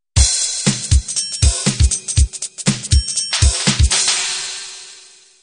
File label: trendy beat intro